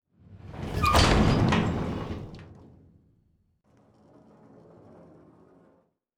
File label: Helicopter Door Open